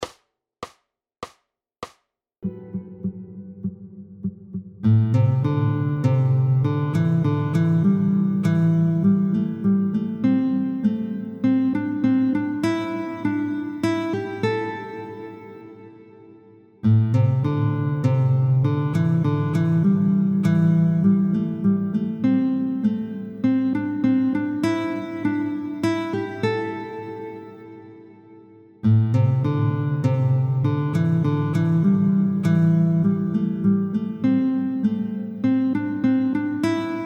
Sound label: Guitar Gym Sequencing oefening